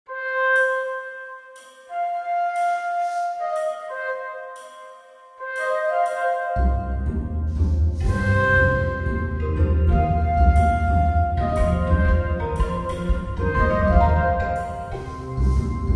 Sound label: Rhythm Mix